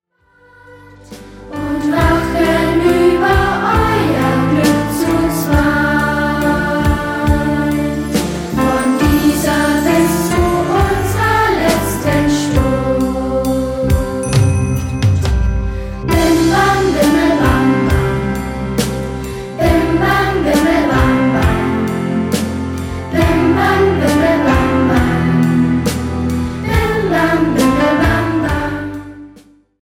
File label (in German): bimbam